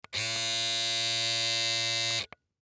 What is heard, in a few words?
Hair Clippers, Hair Clippers On Run Off Short; Barber Salon Razor Electric Trimmer Appliance; Household; Blastwave